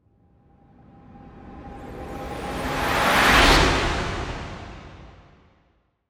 Whoosh; Whooshes

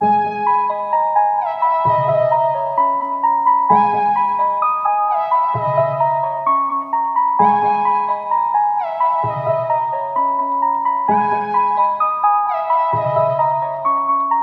Various Melody Loop 130 BPM G# Min; Cymatics; Oracle Sample Pack

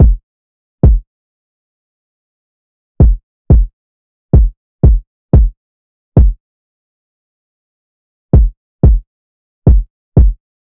Drum Loop 90 BPM Kick; Loop Stems; Cymatics; Vibes II Drum Loop Collection; Sail

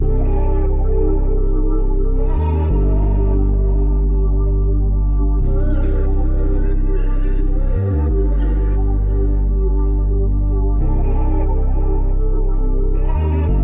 89 BPM G Min, Melody Loops; Burial; Cymatics; Orchid Sample Pack

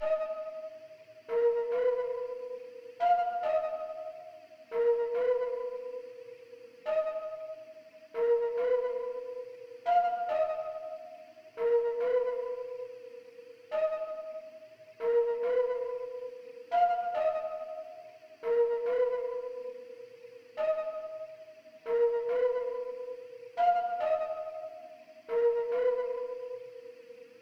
140 BPM D# Min Belltone; Melodies; Dark; Loop Stems; Cymatics; Cymatics - Monarch Melodies (Teaser); Reaper

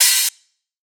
OH; Hats and Cymbals

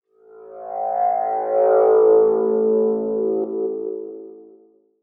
Pad; Dubstep

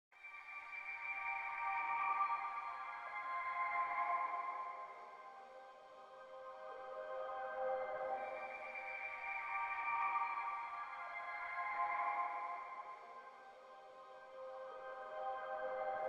120 BPM G Min Reverse Pad; Melodies; Loop Stems; Cymatics; 2022 Melody Mystery Expansion; Unfamiliar

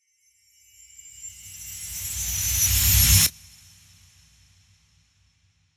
Riser

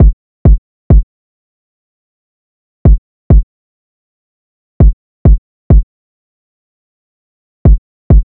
Drum Loop 100 BPM Kick, RnB; Loop Stems; Atrophy

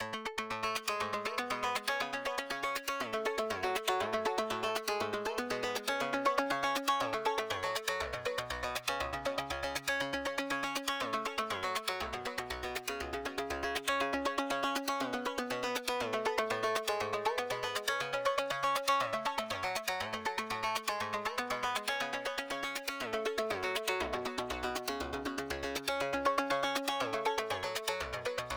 120 BPM F# Min Arp; Melody Loops; Escalade; Retro Collection; Cymatics; ANALOG EVOLUTION Beta

